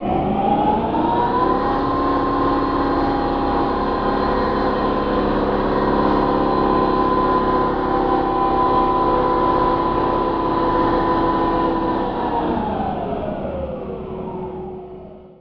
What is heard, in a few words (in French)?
Compresseur Start; OpenBVE; Train; Carmillon